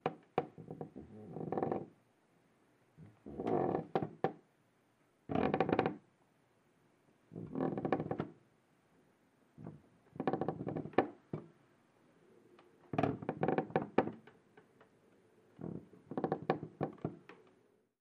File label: Footsteps Walking, wood